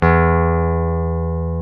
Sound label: Jazz Guitar; Club-50 - Foundations; Jaz-Kessel